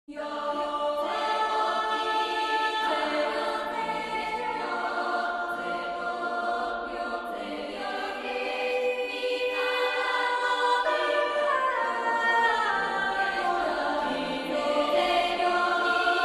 stinger; startscreen

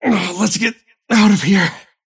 teleport pain; dota; kez

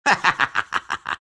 laugh; riki

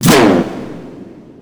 deadshot cast; weapons; dota; muerta

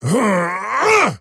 attack effort large; dota; axe

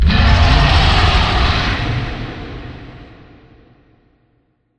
takeover scream; dota

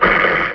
wolf preattack; weapons; dota; lycan

